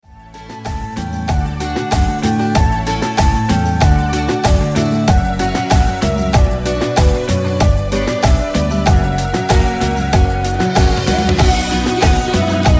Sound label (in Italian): Cumbia Canto